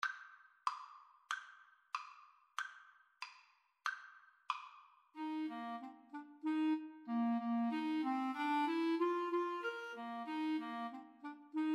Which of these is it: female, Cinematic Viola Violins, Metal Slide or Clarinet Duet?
Clarinet Duet